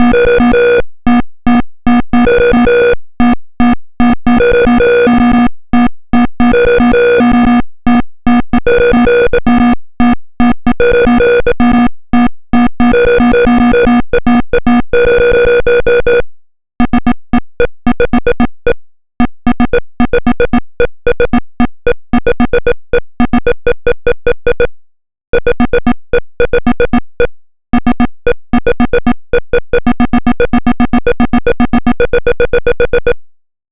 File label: ponger, A song I made with the Pong chip in Furnace